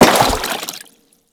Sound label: Crewman Step Water, Footsteps; Warframe; Corpus